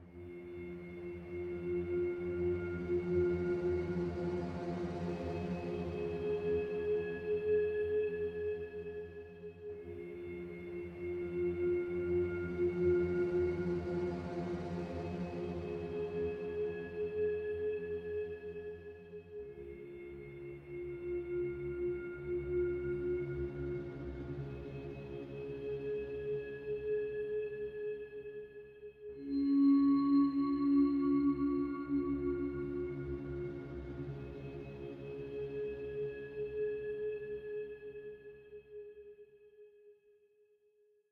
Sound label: Explore Pads Submix; Necropolis